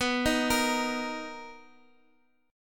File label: B Major 7th B down Guitar Drop D